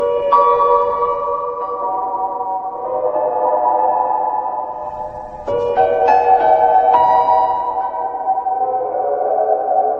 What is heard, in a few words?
scary music; dandy's world